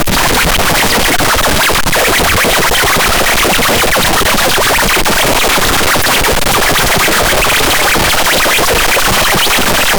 loopable 2d platformer

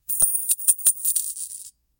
sound of shaking coins ci; money

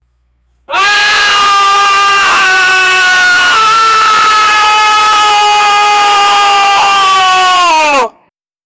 screaming sound; sound effect

